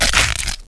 miss; XDM

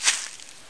grass; XDM

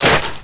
wood, debris; XDM

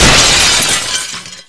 bust glass, debris; XDM

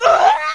death; hl1mp; XDM; hassassin

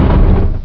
talk stop; XDM

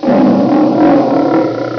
lion; hl1mp; tomber

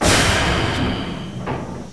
door move; XDM; doors

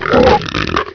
zombie idle; XDM

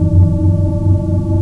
mach; XDM; ambience

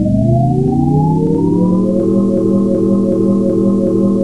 charge, weapons; XDM

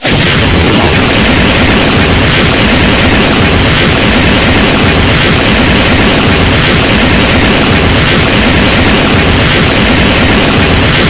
rocket, weapons; XDM

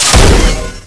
razor disk fire, weapons; XDM

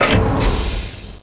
door stop; XDM

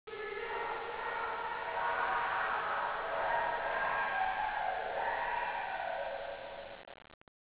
scream; hl1mp

